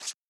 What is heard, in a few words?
Rip Up Paper